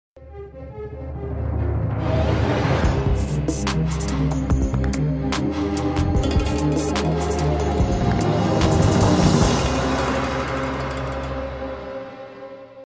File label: notification